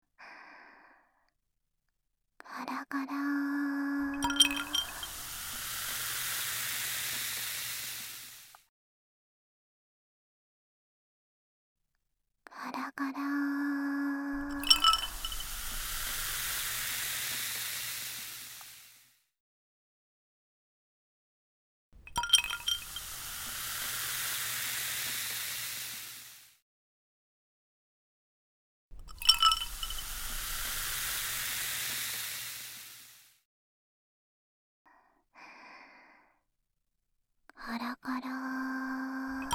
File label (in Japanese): 炭酸カラカラ ループ 右 環境音なし; ASMR/炭酸綿棒/氷のカラカラ; 一途で健気な後輩彼女はあなたの心と体を癒したい ～田舎彼女と甘い夏のひと時～; KU100